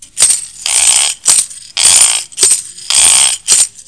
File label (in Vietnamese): Sênh tiền